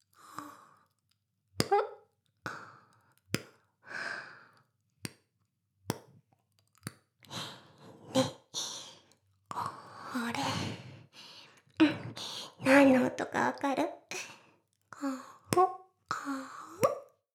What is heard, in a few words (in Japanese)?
耳舐め(LR逆ver); 全妹計画２; 【メスガキ妹】わからせてくれるのよねぇ～?おにぃ～・ちゃん♪【全妹計画<オール・シスターズ> 2妹め】